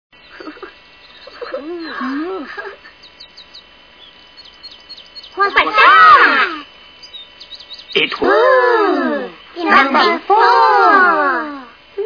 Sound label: ooooh; sound effect